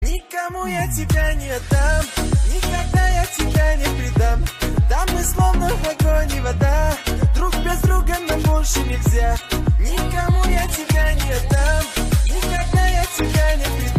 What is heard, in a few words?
ARMENIAN MASHUP